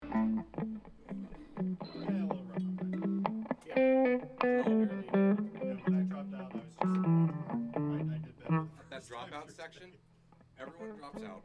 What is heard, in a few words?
Strings Only